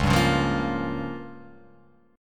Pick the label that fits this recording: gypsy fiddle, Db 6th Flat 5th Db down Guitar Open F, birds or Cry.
Db 6th Flat 5th Db down Guitar Open F